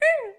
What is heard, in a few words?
Cute